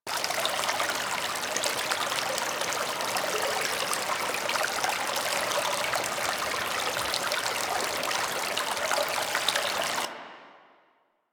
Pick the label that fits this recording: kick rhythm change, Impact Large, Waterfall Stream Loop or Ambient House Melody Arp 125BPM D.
Waterfall Stream Loop